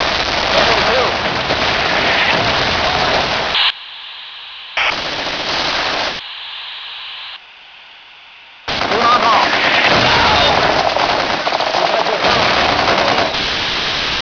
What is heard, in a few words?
radio